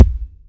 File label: Kicks